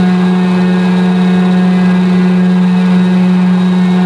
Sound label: drape moving; Max Payne; penthouse